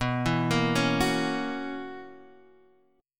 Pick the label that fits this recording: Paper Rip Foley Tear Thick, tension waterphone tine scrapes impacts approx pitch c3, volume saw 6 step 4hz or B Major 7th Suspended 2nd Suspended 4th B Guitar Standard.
B Major 7th Suspended 2nd Suspended 4th B Guitar Standard